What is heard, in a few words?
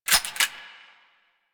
Heavy Weapon A Clip Out; Warframe; Heavy Weapons